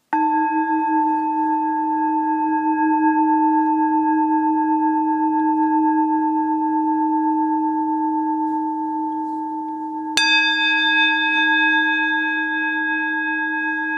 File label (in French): bol bengale 5-600g La dieze